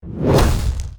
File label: magic hit; Curse of the Azure Bonds